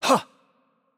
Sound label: Attack Light; bloom minigame